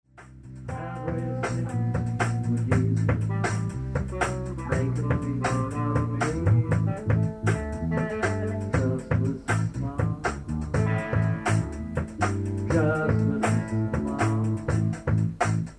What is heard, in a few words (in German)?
Bass Solo